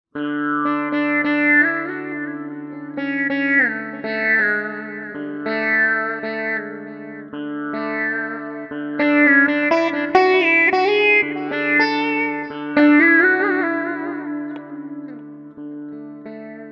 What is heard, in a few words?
reso, guitars